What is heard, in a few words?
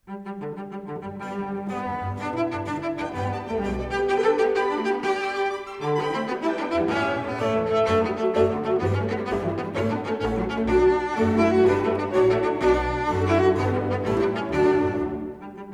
Cello and Strings Ensemble; Couperin; Pieces En Concert; Fournier